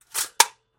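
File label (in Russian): звуки тостера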